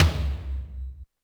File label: Yamaha RM 50 Toms